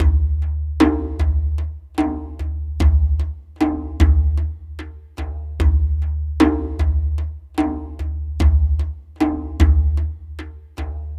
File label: Dumbek Slow, Ethnic Percussion; Sonic Foundry (Sony Creative Software); Ambient Atmospheres & Rhythms